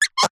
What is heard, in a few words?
cartoon clean rub squeak; zapsplat